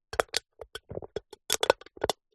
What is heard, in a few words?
soda can empty cut open movements pressure; foley